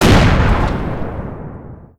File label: Explosion Burst; gamerip